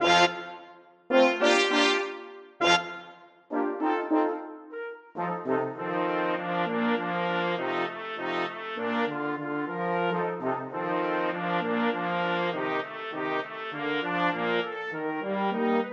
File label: pizzicato polka brass; flexible brass ensemble - 4 players